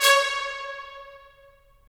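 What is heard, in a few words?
brass trumpets staccato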